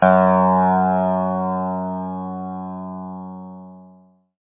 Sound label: chime